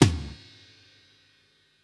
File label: Kit, breakcore Drum Hits